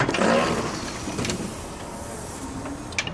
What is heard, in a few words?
Hospital Door Open A, pneumatic